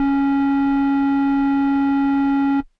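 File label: Air Bass Kawaii C#1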